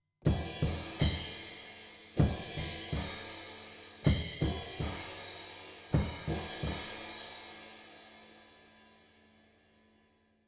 drums stereo cymbals; akg 214

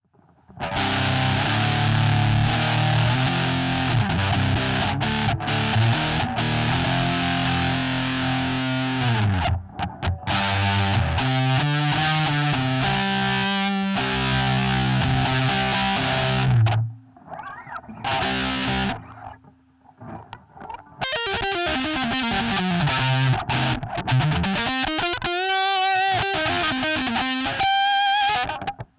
ultra metal; behringer pedales guitare

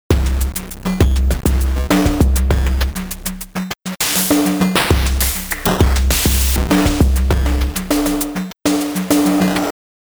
crush; sugar bytes; effectrix

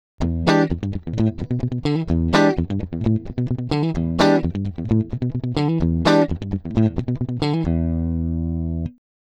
raw guitar; u-he uhbik

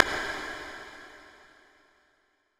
no damping bright, reverb; anderton

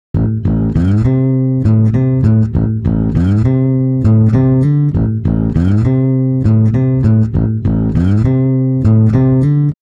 bass

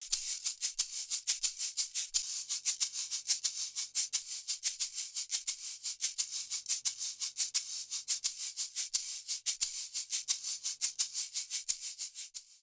shaker; audiotechnica; at2010; sm57

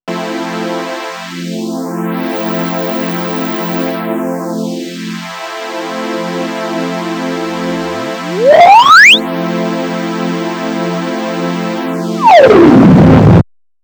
Waldorf Blofeld hires manual not

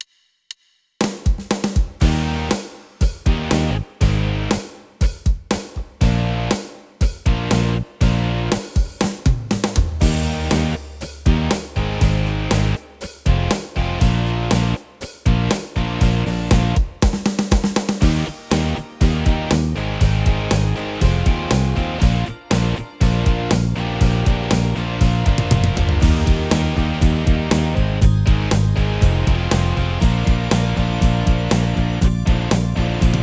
style pop and rock hard rock, yamaha tyros3

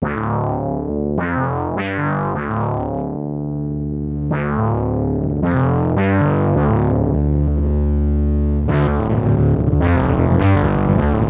fb sync; davesmith mopho

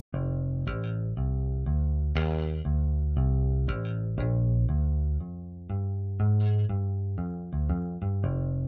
bass; eastwest colossus

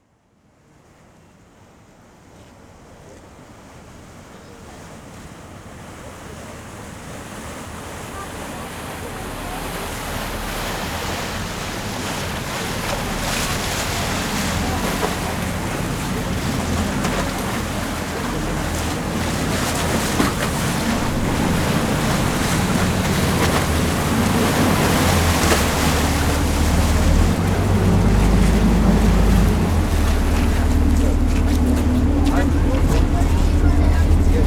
harbour; olympus ls-10

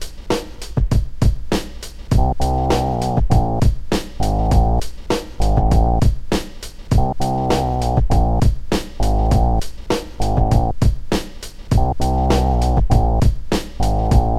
phatt bass; wayoutware timewarp 2600

